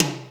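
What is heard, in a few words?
processed tom, drums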